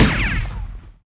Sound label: football kick; HeavenStudio; double Date